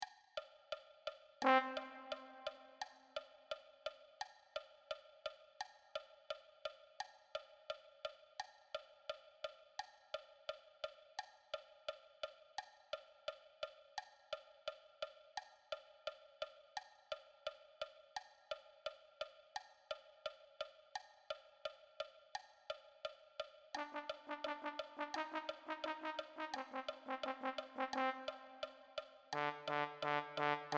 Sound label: Low Brass